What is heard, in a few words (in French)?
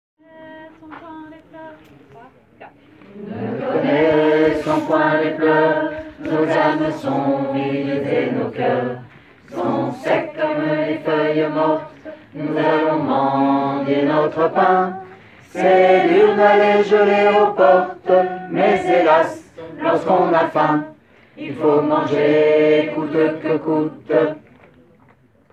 voix haute